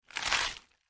Breaking Tearing

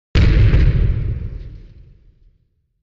Explosion; Deep Explosion With Trail-off.

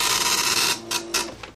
Dot Matrix Printer; printers

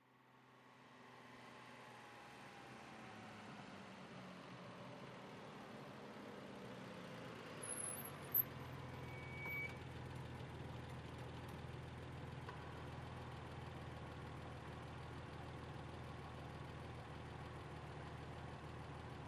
Bmw 530i Slow Stop

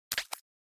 Button Elect Zip Clic

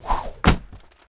Telephone Book Whoo

